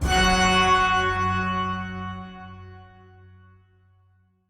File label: Organ Chord Note F Sharp; Polluter Organ